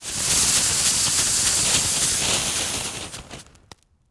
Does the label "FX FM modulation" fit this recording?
No